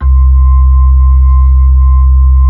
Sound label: B-3, Hollow